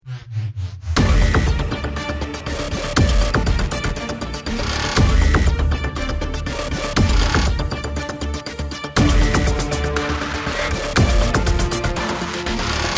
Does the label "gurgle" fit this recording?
No